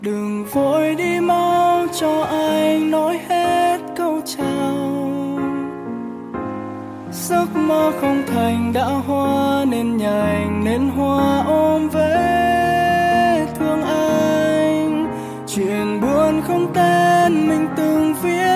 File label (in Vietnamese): Nhac Chuong Piano